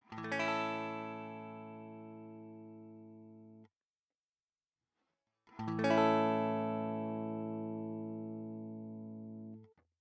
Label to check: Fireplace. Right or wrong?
Wrong